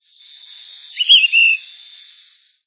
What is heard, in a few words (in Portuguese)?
uirapuruzinho-pequeno